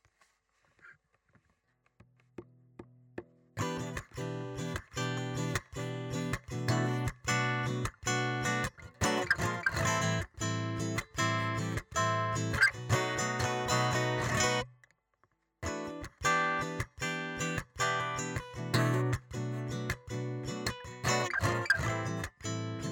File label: Em7 Bm7 Am7 B Dom7 Em C dom7 B Dom7